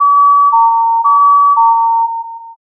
ambulance

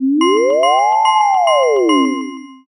firetruck

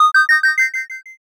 sparkle

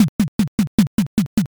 bon taiko

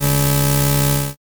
weld

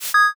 transition

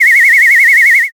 whistle long

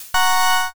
appear

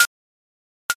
stopwatch

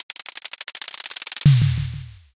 drumroll

